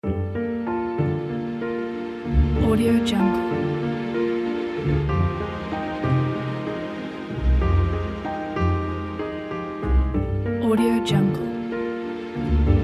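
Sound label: emotional soundtrack tango; redpandarecords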